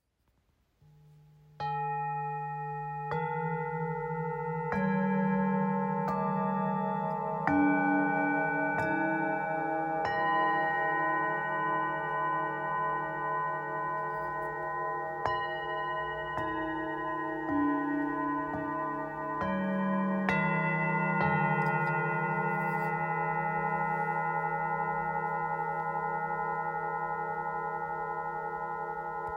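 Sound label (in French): Set pyramide 7 bengale, bol chantant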